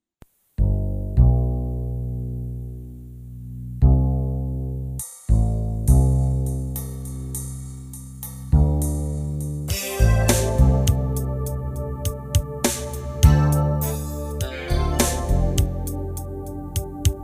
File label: Ballad